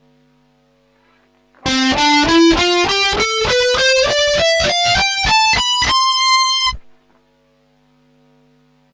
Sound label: chorus, guitar effects